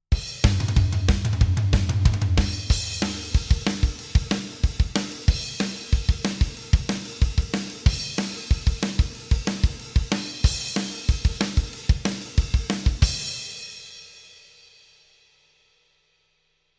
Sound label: Drum wet